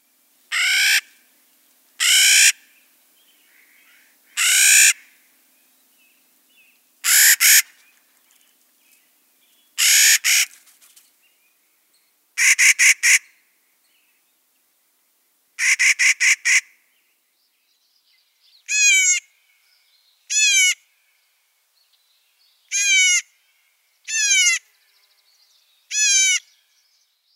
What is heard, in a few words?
Clark's Nutcracker